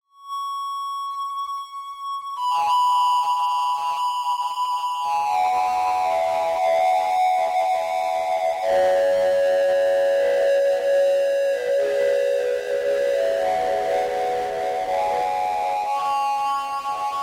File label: colours of noise; selffish